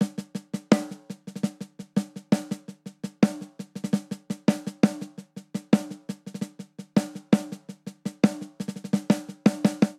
Straight Seven Rock Snare Only 7_8 84 bpm; Ableton; Factory Packs; Drum Booth

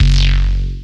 Hottbottom F#0, analog synths; Ableton Foundation FreePacks

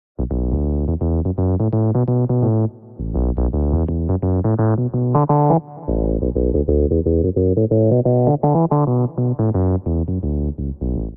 Piano D Minor 85 bpm; MIDI Clips; Tonal; Bassline; Muddy Whirl; Ableton; Factory Packs; Chop and Swing

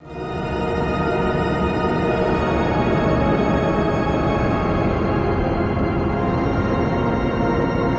Pad Full, synth; The End; The Forge by Hecq; Ableton; Factory Packs

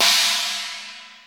Ac China, Drums; Ableton Foundation FreePacks

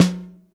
SN Top, Snare

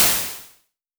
Noise Short Room, Ambience and Small Rooms; Ableton; Convolution Reverb

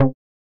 Short Tube, Synth; Synth Misc; Analog; Ableton; Factory Packs; Synth Essentials

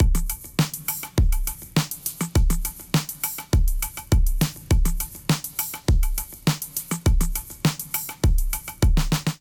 Sky Kit 102 bpm, Drums; MIDI Clips; Uluru Sky Kit; Drive and Glow; Ableton; Factory Packs

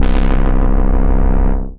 Bass; Basses; Ableton; Factory Packs; Creative Extensions

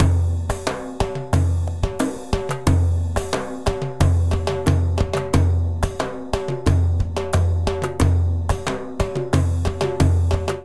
Murga 90bpm, Latin Percussion; Ableton; Factory Packs

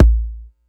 digital attacked, kick; Ableton Foundation FreePacks

